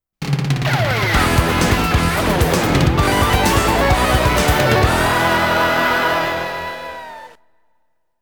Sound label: Goal BGM; SPEED